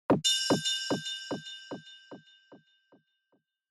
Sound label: Surprise Low Delay; Onoma